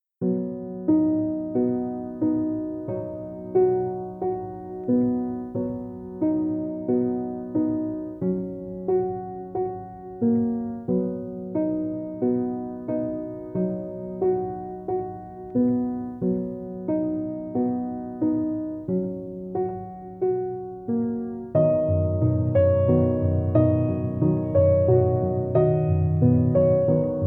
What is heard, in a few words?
Piano